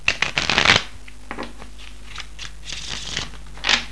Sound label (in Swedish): Blanda; Solitaire House